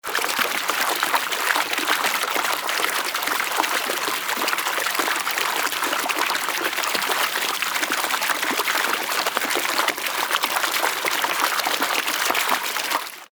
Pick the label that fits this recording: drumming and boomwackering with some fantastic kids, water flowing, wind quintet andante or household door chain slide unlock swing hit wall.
water flowing